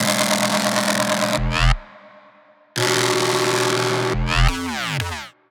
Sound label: 174 Bass E Mid